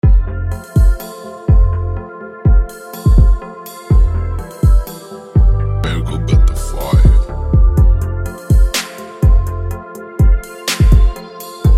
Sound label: epic heavy trap beat instrumental